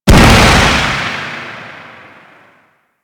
Reckoning Explosion; Warframe; Warframes; Paladin

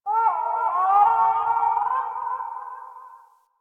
Landscape Distant Birds, Ambience; Warframe; Venus Open World; One Offs

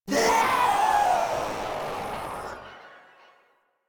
Proto Infested Death, Enemies; Warframe; InfestedNinetyNine

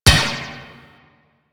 Ball Bounce Metal; Warframe; Missions; Tenno Ball

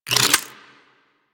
Sapient Pistol Reload; Warframe

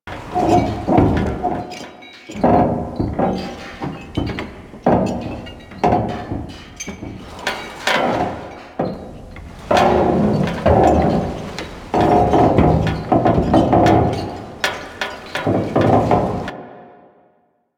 Camp Metal Rattle Loop; Warframe; Quests; TheNewWar; Ambience; Drifter Camp

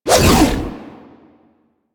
Hammer Shield Throw Whoosh A; Crp Hammer Shield

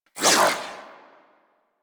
Primary Tail, Weapons; Sapient

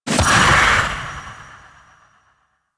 Fairy Soul Impact; DE; Warframe

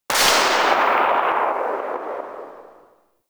Igniter Pistol Tail; Warframe; Weapons; Corpus

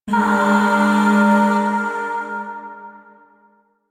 Shawzin Min Chord L; Music; Chords; Warframe; Zariman Void Shawzin; DE

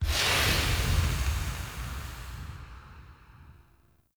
Forcefield Border End; Objects

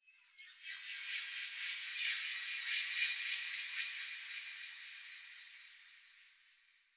Texture Interior Small, Ambience; Sentient Lair; Warframe

